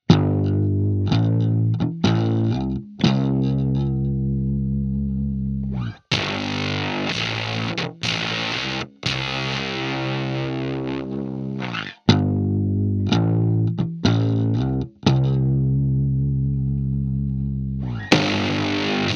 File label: oc-2 bass